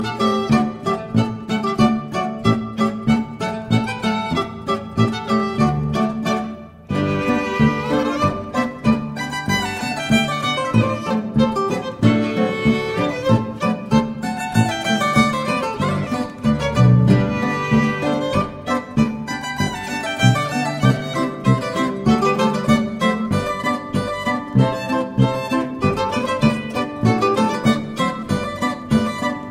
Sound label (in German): Polka, zither; Gretchen Polka